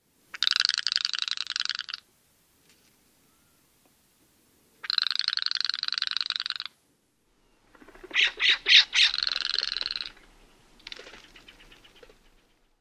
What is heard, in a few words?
rattles